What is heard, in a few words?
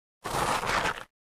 Object Dragged Acros, rocks & dirt